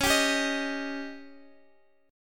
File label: C sharp Suspended 2nd Flat 5th C sharp Guitar DADGAD